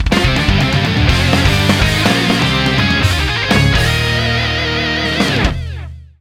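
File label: Jingle WIN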